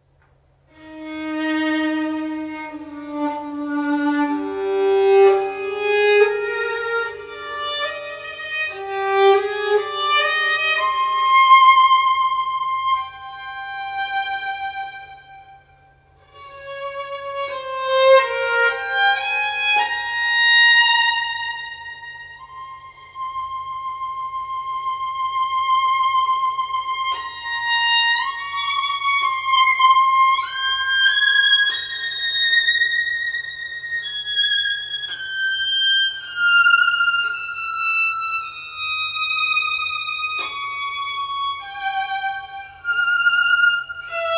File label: Original Violin Cadenza